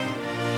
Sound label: strings